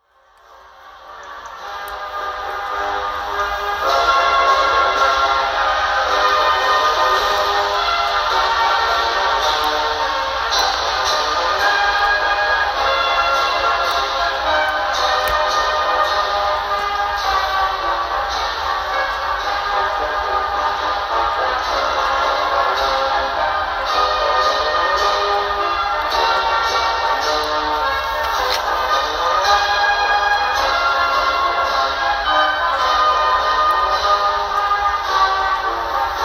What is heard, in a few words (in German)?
Militärmusik; RAF